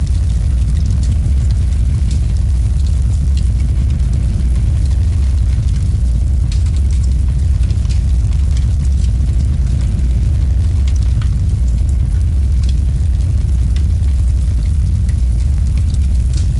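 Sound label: ambience Fire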